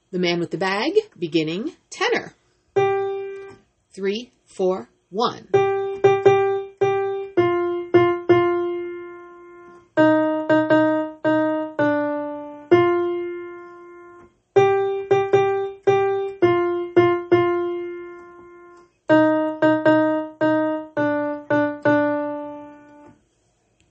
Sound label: Tenor